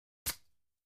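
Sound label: Athletic Tape Stretch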